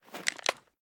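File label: pistol reload extended mags large end; gmod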